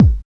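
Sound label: BD, bass drum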